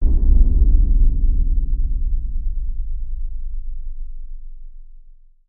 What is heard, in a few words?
Bass Impact Low Drum; impacts